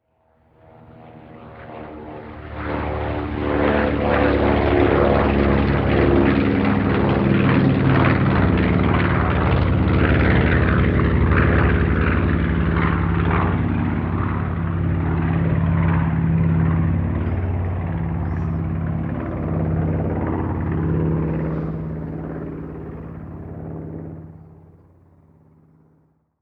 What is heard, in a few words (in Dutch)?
WOII take-off vliegt